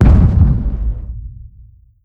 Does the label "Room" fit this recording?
No